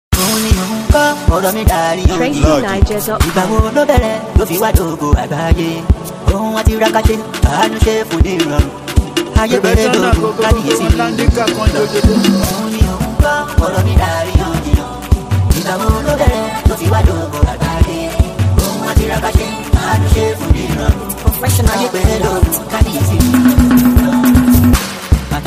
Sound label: Professional beat Speedup